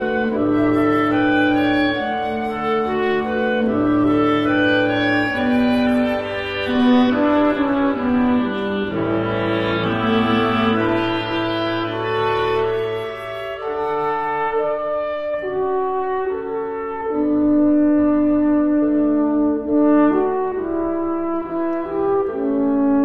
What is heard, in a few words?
Woodwind Quintet; Stille, Stille, Stille